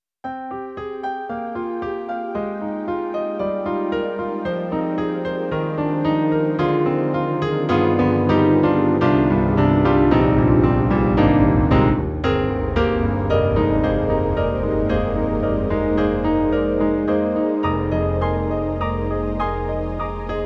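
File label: Roland SonicCell Warm Pad Piano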